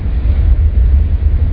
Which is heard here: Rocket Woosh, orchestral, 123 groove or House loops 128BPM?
Rocket Woosh